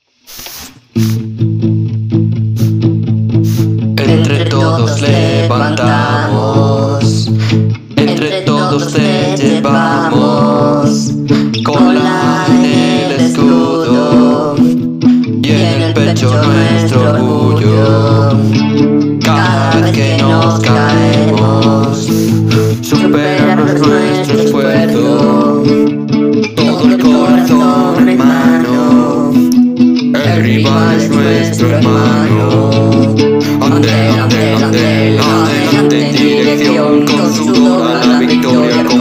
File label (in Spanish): Himno Musica; Himno Andel